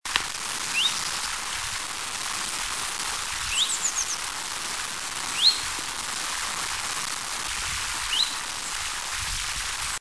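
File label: Chiffchaff (Phylloscopus collybita), Finland; Hanko, Uusimaa, Finland 3 October 1998; The call of Chiffchaffs was normal during the autumn of 1998 in southern Finland; Linnut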